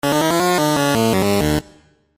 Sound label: game over; rygar